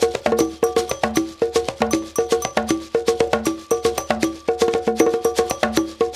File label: TAP, African Tap; Spectrasonics; Supreme Beats